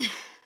Grunt; Lewd SFX

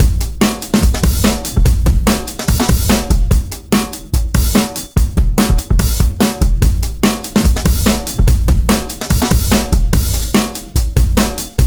breakbeat 3, 145BPM

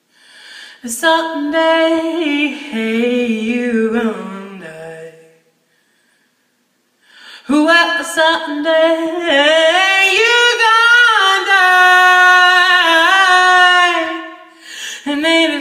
[F] [original song][soulful][jazzy][acapella]

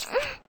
Moan

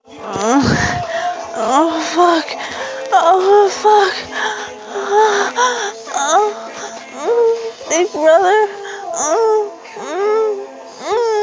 F4M, moans, cute

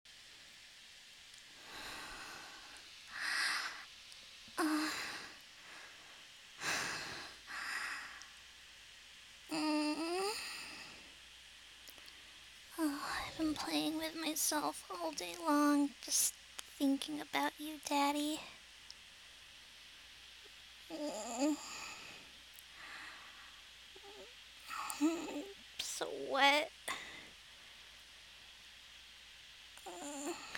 Whimpering, Girly noises; F4M; Waiting for Daddy; DdLg

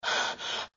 Pant

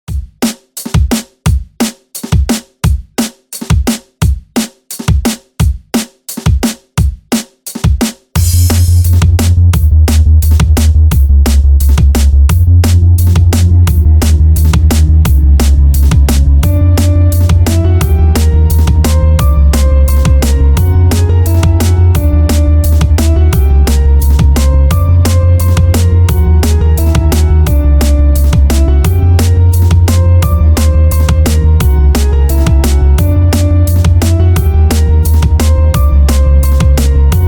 Drum & Bass

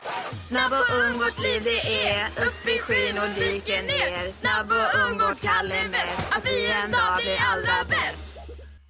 Chant, Swedish; Junior Speedsters Chant; Season 1

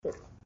Swallow; Lewd SFX

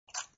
Suck; Lewd SFX

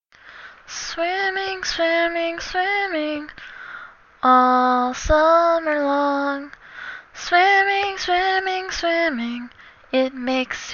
Swimming Swimming Swimming (Quaggan Song)

